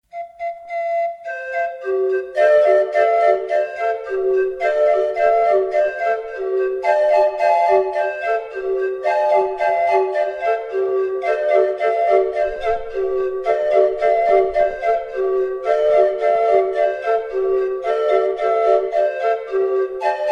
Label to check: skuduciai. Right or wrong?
Right